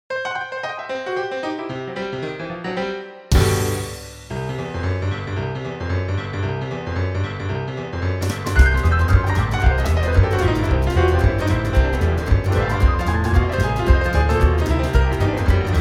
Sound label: Blues